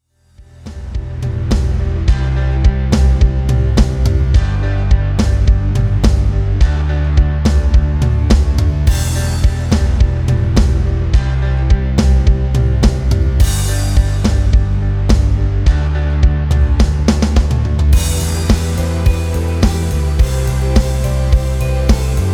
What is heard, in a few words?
rhythm